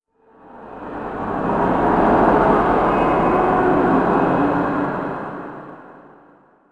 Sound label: city, zones; Zoom3City